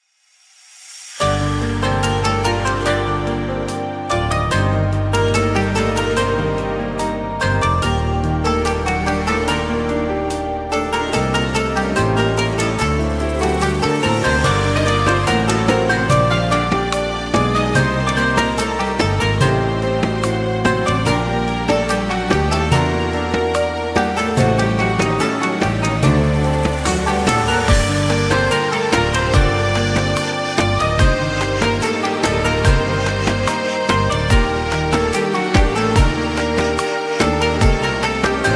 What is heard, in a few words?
Ramadan background music